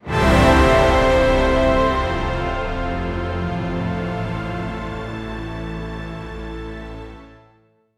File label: triumphant fanfare titled